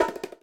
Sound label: combat start; Holy Potatoes A Spy Story